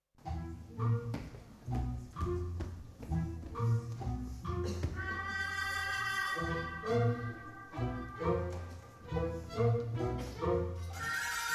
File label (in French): chanson des enfants perdus